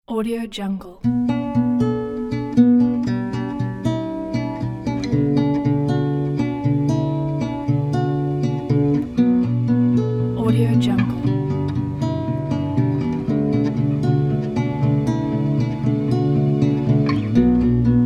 beautiful acoustic guitar melody

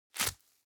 foley velcro open; smartsoundfx

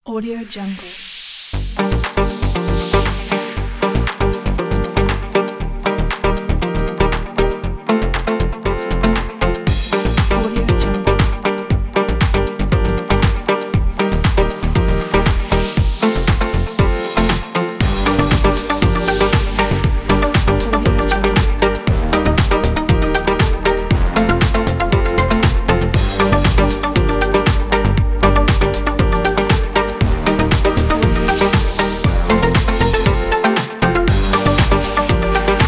upbeat summer tropical event music; soundmanmaster

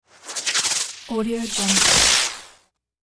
firework spinners; hollywoodedge

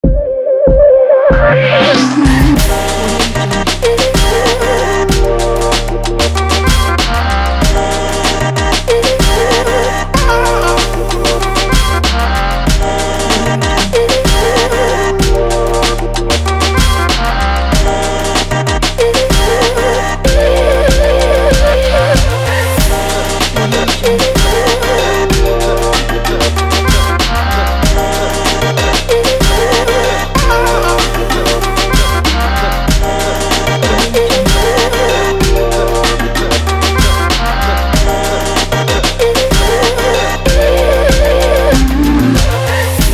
for hiphop

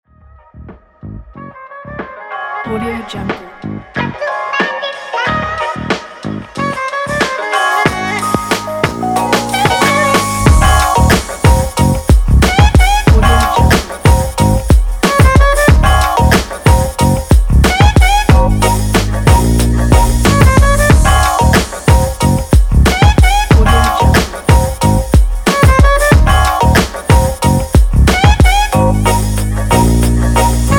hiphop in funk

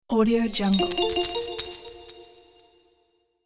treasure gold revealed

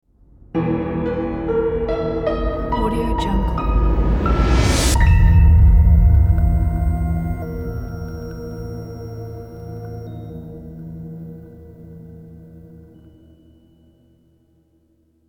creepy horror ident

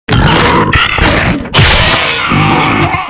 stalker triumphant; monster within; soundmorph

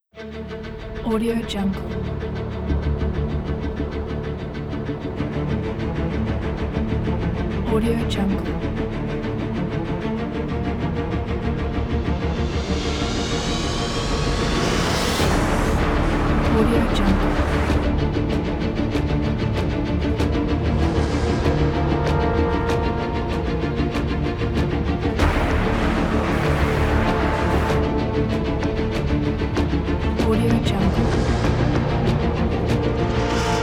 chaotic

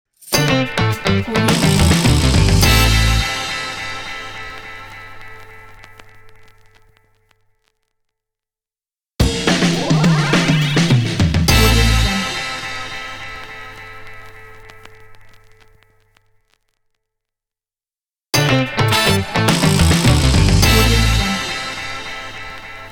vintage funk stinger ident

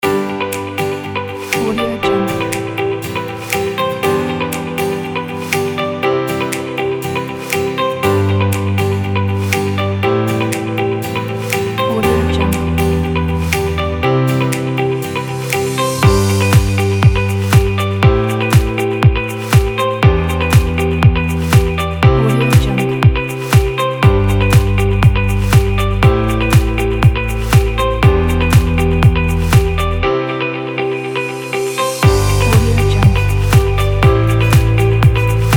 ambient music kit

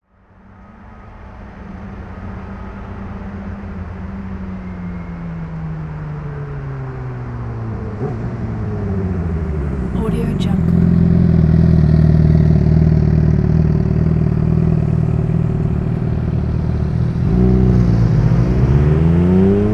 motorcycle

